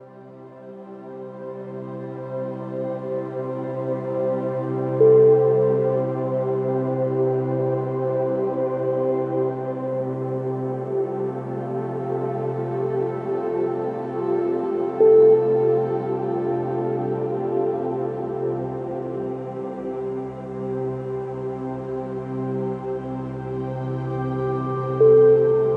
healing and meditation mood

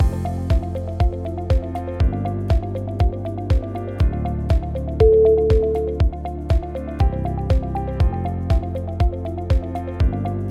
corporate office

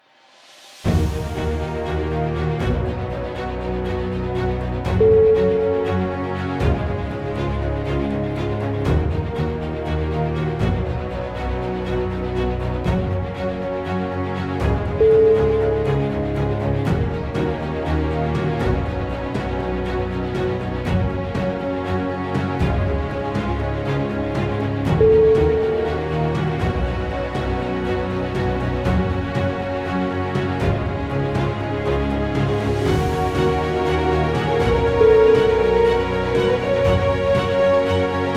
epic inspirational trailer

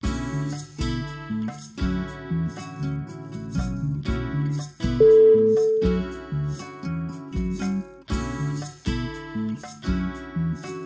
rainforest guitar

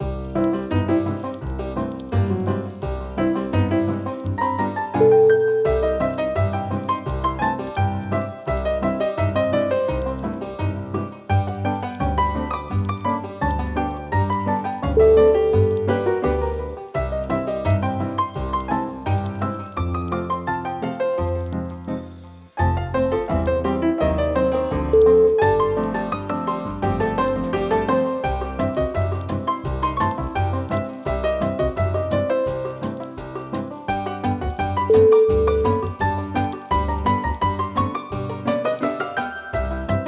tap room rag

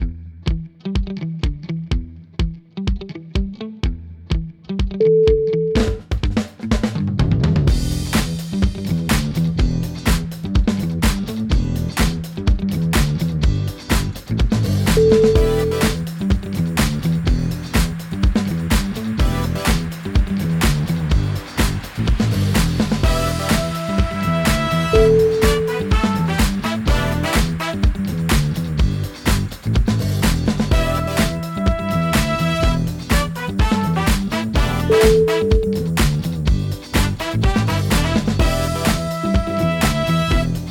funky people

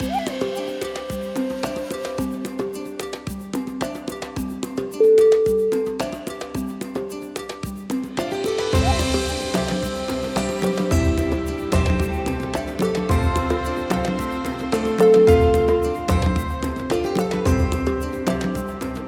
african people

